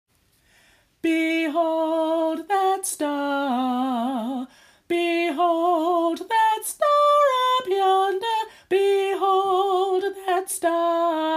SOPS, gospel choir; BEHOLD THAT STAR

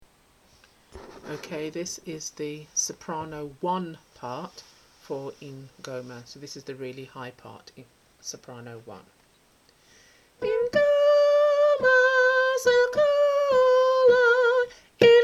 Sop, gospel choir; Ingoma

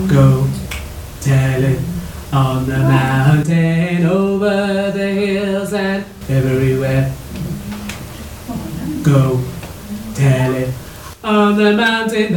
Go Tell It On The Mountain Bass

